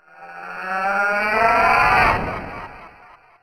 death scream; greed

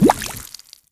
rotten heart

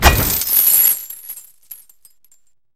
chain break

